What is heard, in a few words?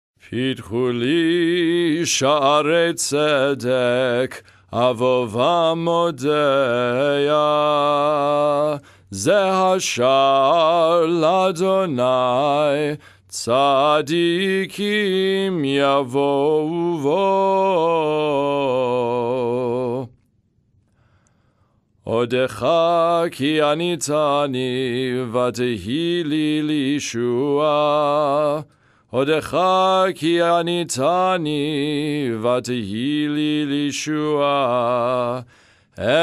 pitchu li nusach ukrainian dorian; Festival Shaharit (Lower Voice)